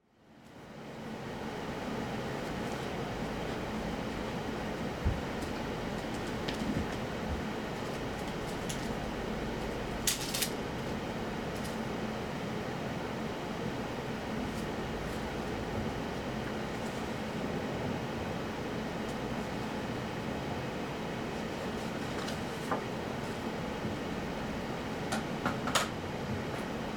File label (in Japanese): 空気清浄機から出る噴出音; タロウ